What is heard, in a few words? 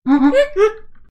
honk